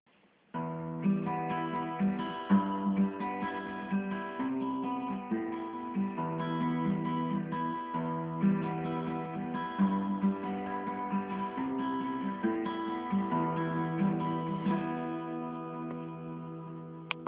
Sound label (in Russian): Запись, Играем на гитаре